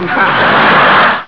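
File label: laughing short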